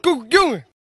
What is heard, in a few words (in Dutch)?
koekoek